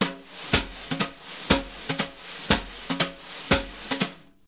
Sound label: BRUSH, Jazzistic; Univers Sons